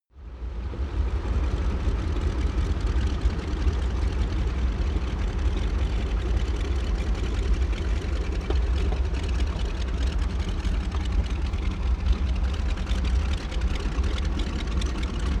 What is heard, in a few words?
propeller plane ju52 engine idle